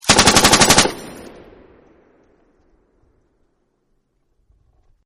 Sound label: M240 Machine Gun Burst 3; Machine Guns; VRSonic